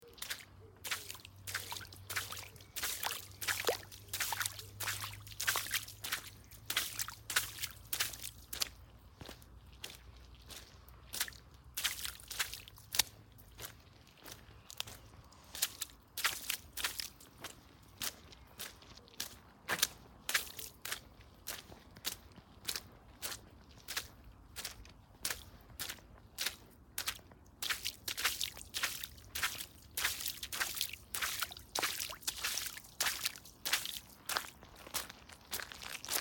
shagi lujy

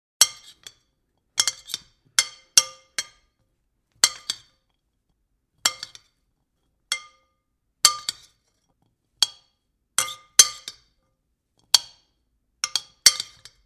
priem pishi lojkoy

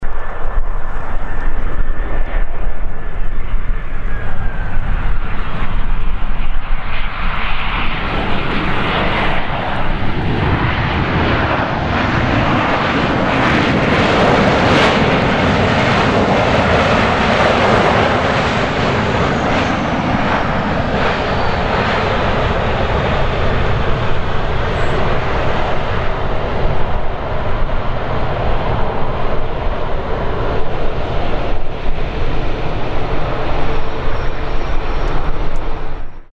posadka airbus a 319; звуки у самолёта при взлёте или приземлении

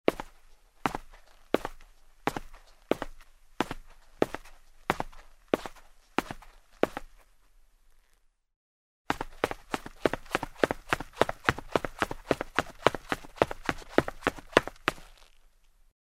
shagi po dereviannoi lestnice v tuflyah

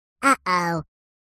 zvuk oou pechalnyi

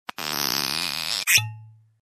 probka

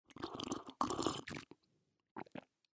othlebnul gariachiy chai

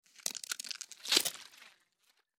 zvuk skeleta

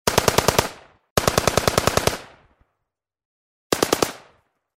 strelba iz avtomata

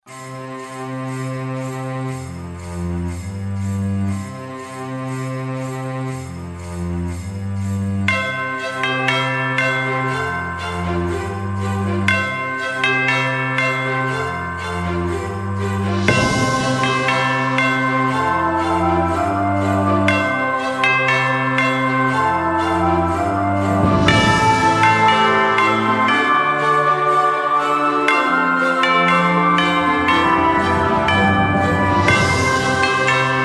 rojdestvenmuz

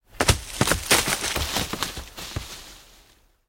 upal na zemlu i perekotilsya

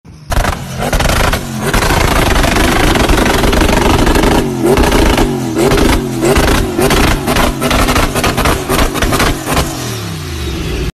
vyhl s ognem

